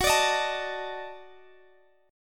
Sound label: Eb Minor Major 7th Flat 5th Gb